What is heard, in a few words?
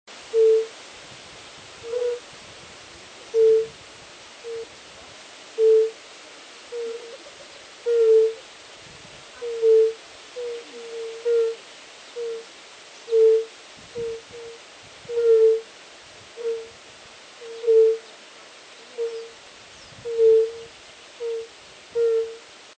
Bombina bombina; voice